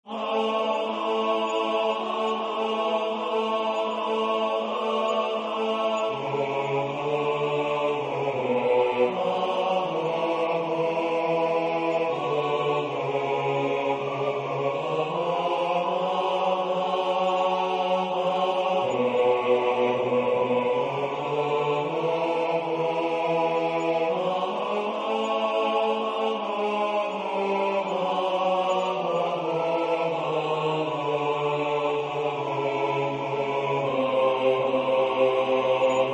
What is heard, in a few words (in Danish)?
bas; Lyrisches Intermezzo